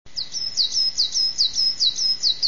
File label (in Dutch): zwartmees